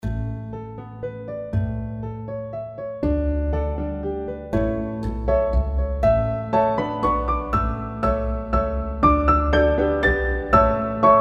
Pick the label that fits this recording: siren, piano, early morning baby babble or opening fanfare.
piano